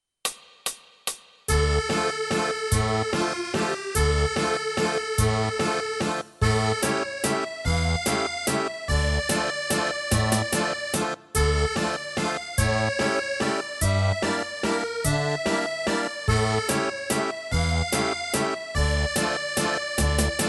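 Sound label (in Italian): Mongolia, Fisarmonica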